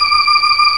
Organ, Barton Tibias

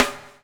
BUBL, Backbeat; Spectrasonics - Backbeat

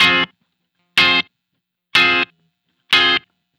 Guitar Stabs; Sonic Foundry; Rondo Brothers Trip-Hop Loops & Samples

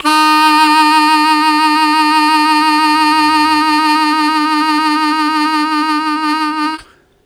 Strong Vib Sustain Eb1, Harmonica; Zero G

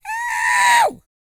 YELL, MALE HUMAN; Spectrasonics Vocal Planet

